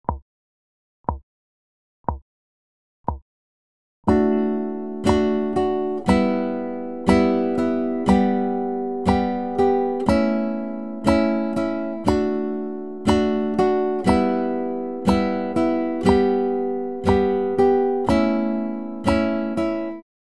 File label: strumming